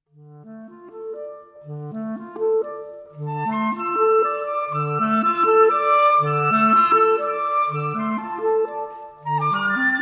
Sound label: Fantasy for Flute & Clarinet